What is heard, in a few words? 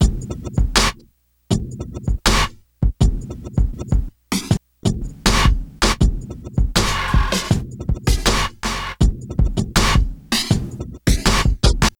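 Loop, beats; Sonic Foundry (Sony Creative Software); Esoterik Beatz; Quesy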